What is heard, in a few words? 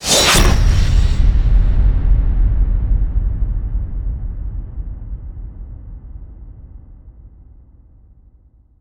Hit FX Decapitate; Brand X Music; Brand X Music Catalogue - Editor's Essentials